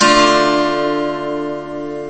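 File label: guitar chord D minor Dm ascending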